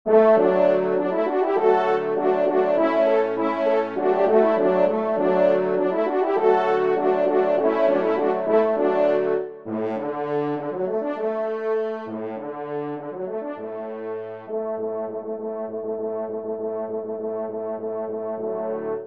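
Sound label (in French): ensemble, trompe cors; Choeur de Robin des Bois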